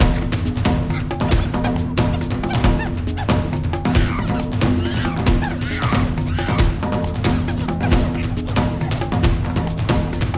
monkeys Layer; jumanji

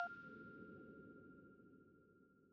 count Up Loop End

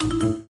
spin Button Click; jackhammer

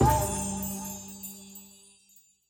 end Of Round; temple of nudges

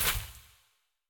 win Symbol Flash; fairyhansel

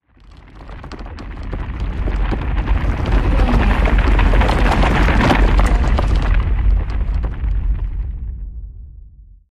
stampede Pass; jumanji

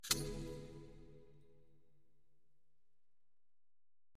spin Button Quick Stop; fairyhansel

